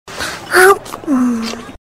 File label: eat; svencoop; FZSaySound